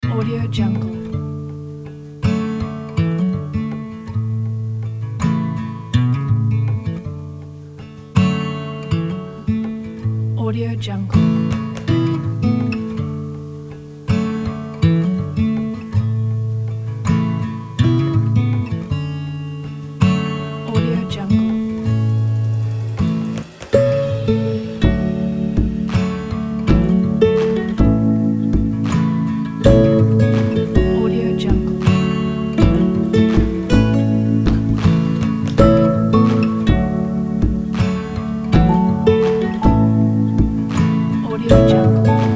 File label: acoustic guitar